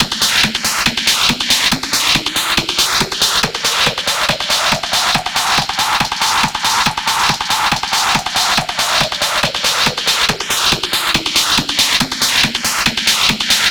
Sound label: Filt Add A